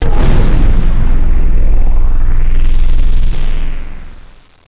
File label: EXPLOS; environ; Gunlok